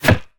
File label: Bullet Impact Wood Light; jasem just another shooter with electronic music windows 2017